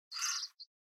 Portable Stereo Driving Noise CD Seek

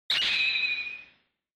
Flash Low Short; Onoma; オノマトペ 光る; 低 短

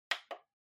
Portable Stereo Button

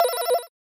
Warning Alarm

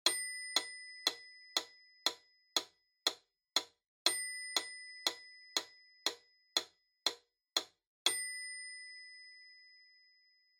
Metronome Bell Click Mid

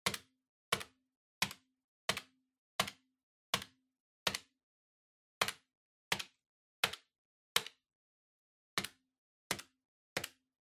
Calculator Desk Single

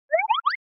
Motion Pop

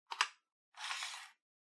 Portable Radio Antenna Extend

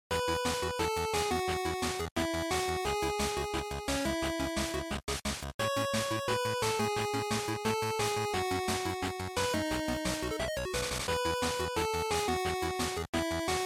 GB Fighting

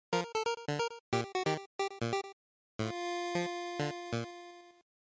Miss; GB

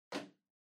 Portable Cassette Far Put

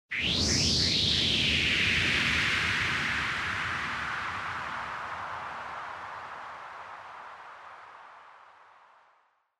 Motion Speed Lines Low Long

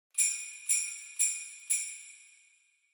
Sleigh Bells Knock Gentle Short

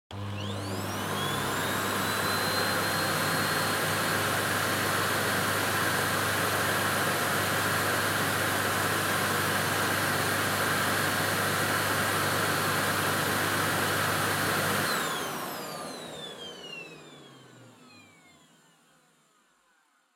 Vacuum Cleaner Vacuum Floor Soft High Still; 掃除機03-16(バキューム 床 ソフト 高 静止)

